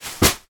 plastic bag or bin bag with clothes in drop on floor; foley